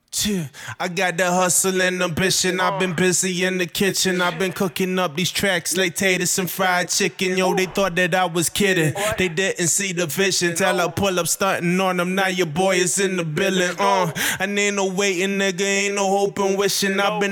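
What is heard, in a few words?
hip hop 150 G minor, Acapella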